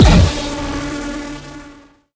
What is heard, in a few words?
Shot Beam Medium Derail Gun